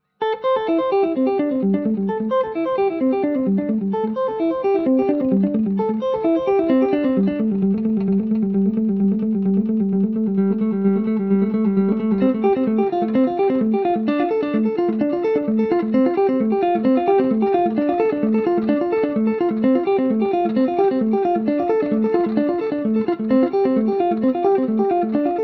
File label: delay